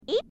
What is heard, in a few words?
eep